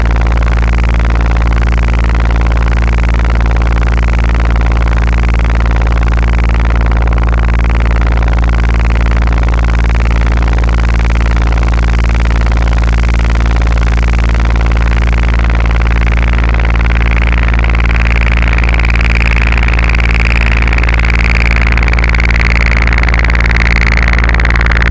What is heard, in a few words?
prop plane, analogsynth, NOISETOASTER